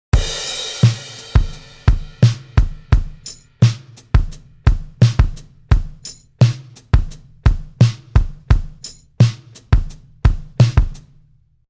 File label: Drums